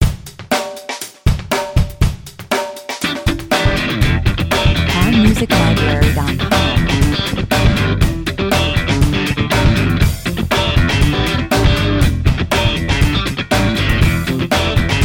funk, 120 bpm

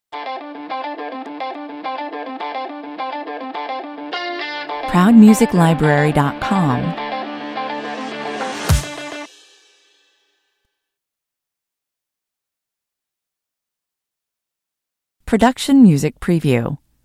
stinger

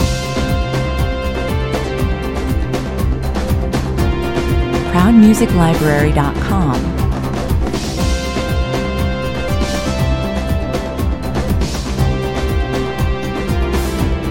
goa techno loop

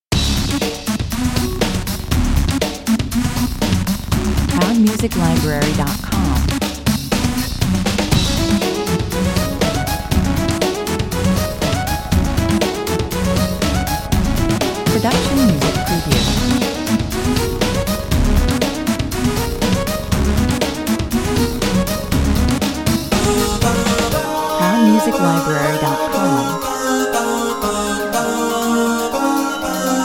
commercial

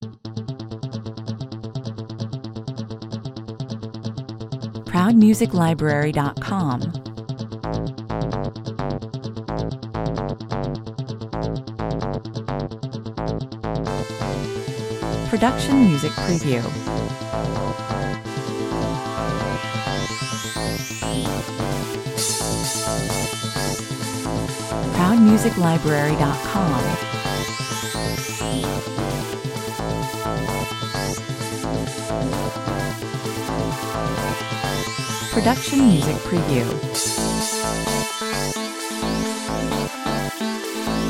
crossfade flowing 130 bpm

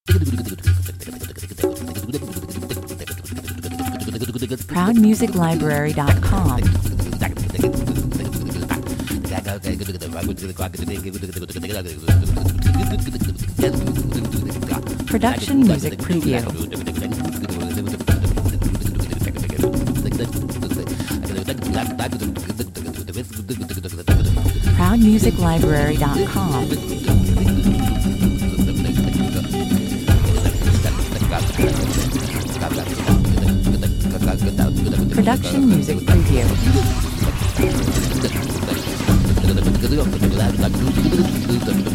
ethno scat (complete) 155 bpm

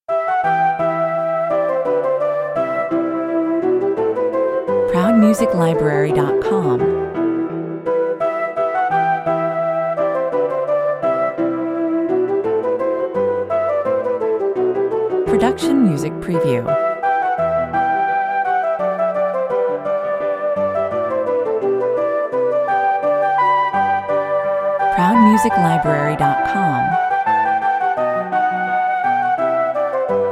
flute concerto in e minor, 110 bpm

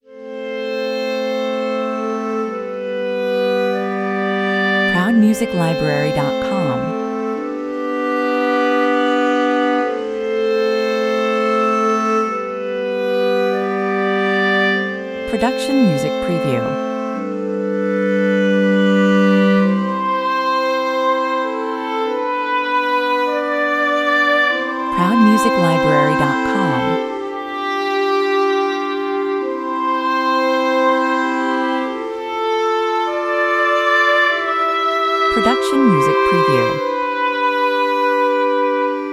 only woodwinds 97 bpm

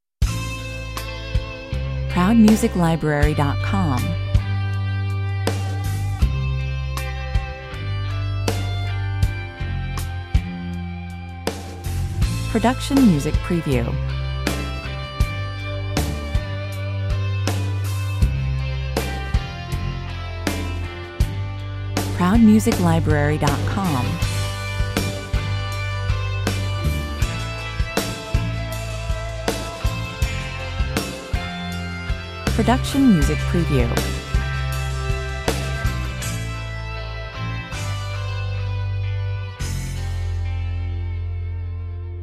slow rock

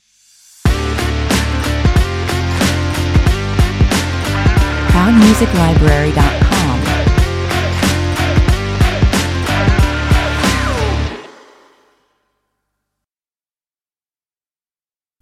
brave beats (stinger)

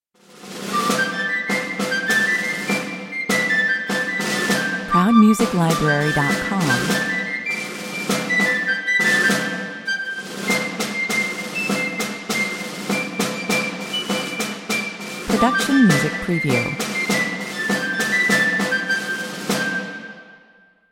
little piccolo 86 bpm

